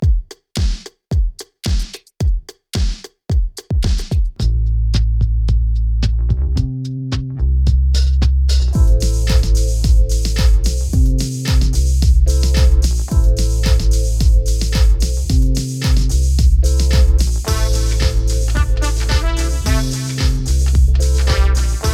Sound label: Liquid RnB Funky move 110 BPM; Humming3™ MUSIC